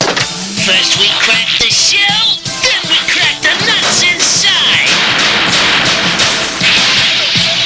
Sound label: rumble; First we crack the shell...